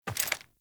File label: Steps